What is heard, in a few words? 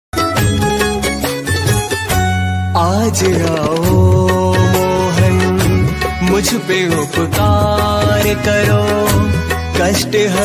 bhakti ringtone; kanha ringtone; radhe radhe krishna